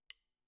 Snare Top

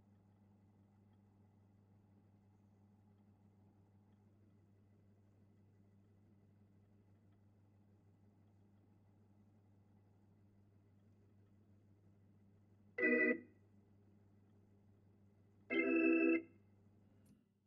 Hammond

